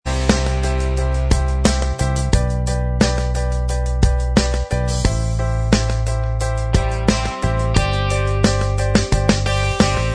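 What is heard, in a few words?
Instrumental Track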